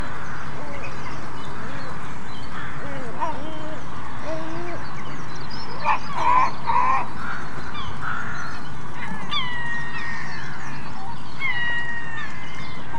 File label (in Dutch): Grote Mantelmeeuw Larus marinus